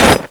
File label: robo step, footsteps; Garry's Mod; tf2; mvm